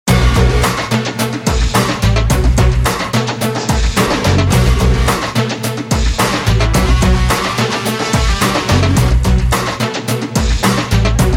Solo

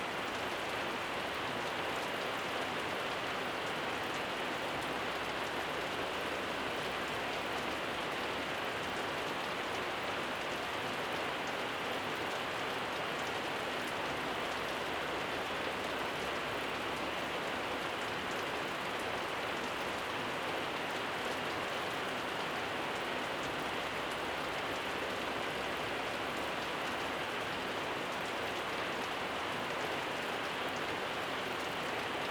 Sleep Rain Blissful Thunderstorm Ambience

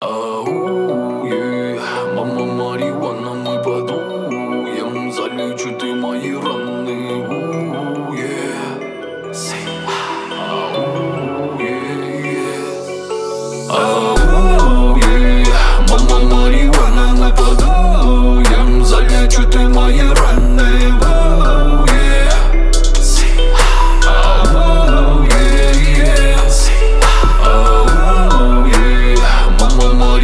33-37hz Low bass

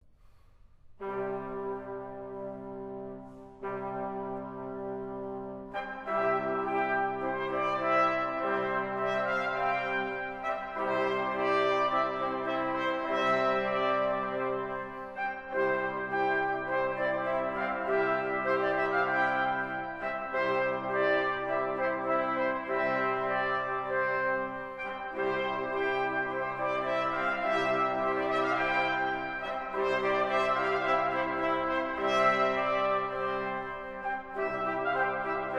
Fanfarria Instrumental

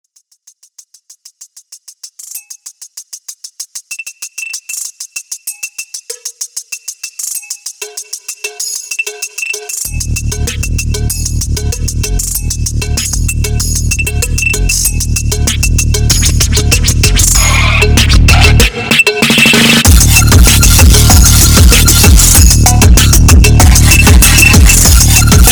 DubStep, DubstepPHONK

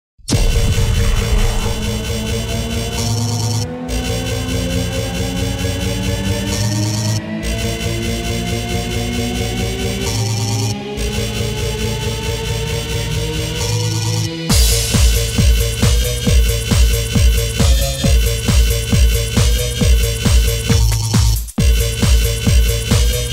Police Siren Remix; Cyberpunk 2077

